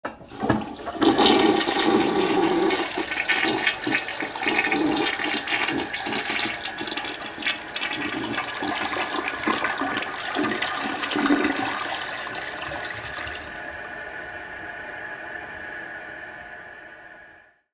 toilet flush

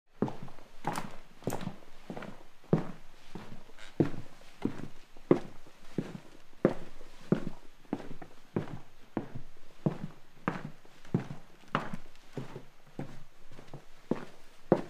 footsteps

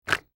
snoring

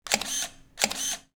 camera clicks

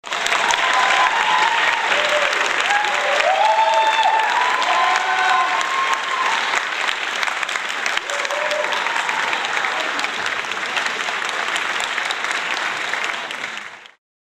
applause

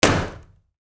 bang, mechanical sound effects

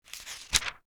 page turn

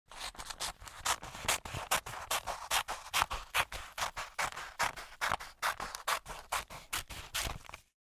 cutting paper

